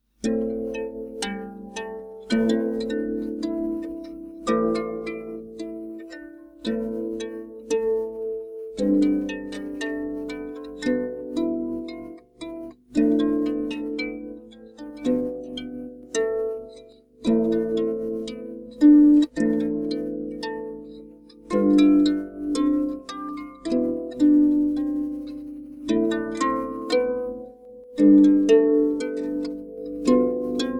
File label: celtic harp reflecting a sad day